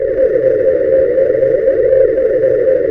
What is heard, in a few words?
DRUM CHOIR, PADS FX